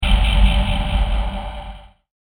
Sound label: Impact; Low Impact Sound Effects